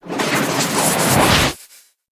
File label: melee charge up generic; Warframe; weapons